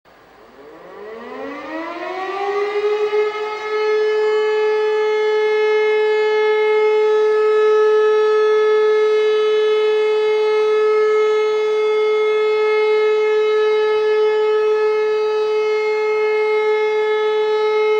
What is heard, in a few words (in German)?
Entwarnung, Sirenensignale